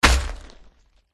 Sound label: dragon boss attack contact bottom